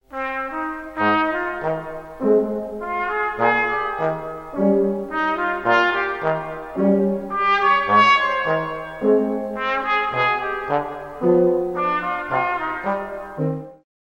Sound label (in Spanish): trompeta